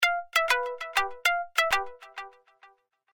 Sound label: Ring Synth; Google; Android Open Source Project